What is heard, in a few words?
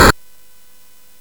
CSG8580R5, GAMES; Street Rod